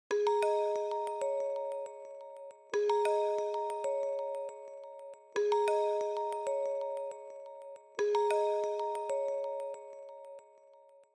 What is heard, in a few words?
Smartphone Alarm Dry